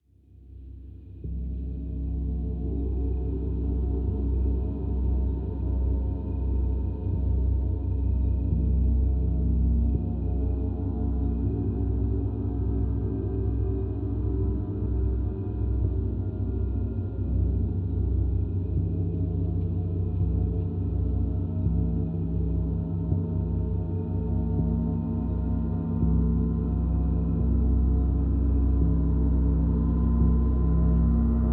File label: Gong Bath Processed 8D